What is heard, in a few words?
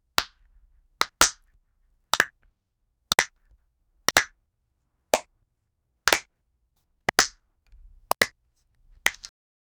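clap sounds from a crowd, clapping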